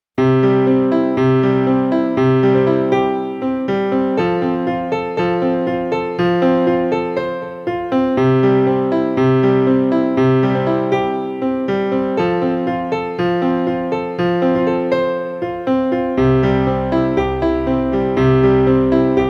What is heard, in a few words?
Piano Album